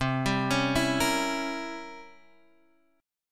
C 7th Flat 9th C Guitar Open G

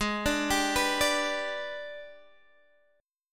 Minor Major 7th Flat 5th, Guitar, DADGAD; Gsharp; x,11,12,12,14,12

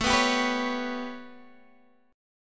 B 7th Suspended 2nd Sharp 5th A

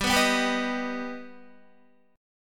G Augmented, Guitar, chord; Listen to G+ strummed

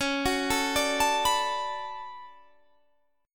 D Major 13th C sharp, chord

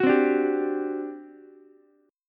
G Major 7th Suspended 4th, G, down, Ukulele; GM7sus4 strummed; JGuitar

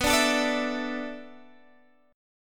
A Suspended 2nd Suspended 4th

